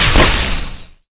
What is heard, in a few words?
Gun Skin